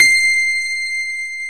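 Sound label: grand piano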